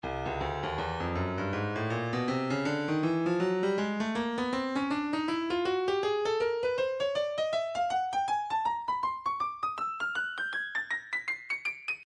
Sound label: breakbeat midi files 080 084 bpm; lurch a; Stylus Breakbeat Midi Files